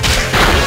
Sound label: tackle; pokemon x y